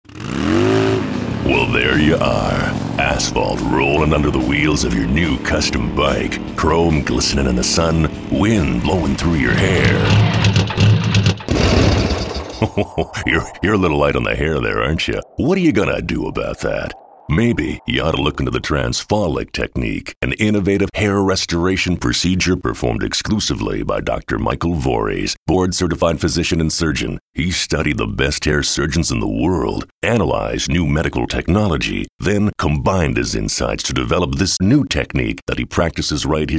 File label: Motorcycle; Charleston